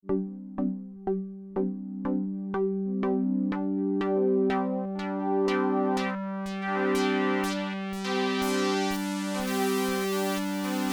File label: harmony LP; tellun